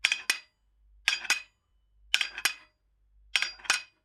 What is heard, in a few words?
Weight Bench Press Movement; Fairlight Sound Lib